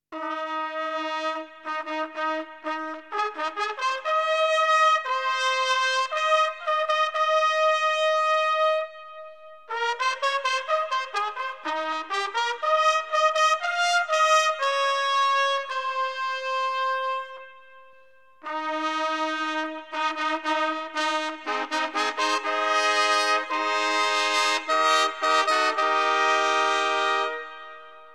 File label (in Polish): Hejnał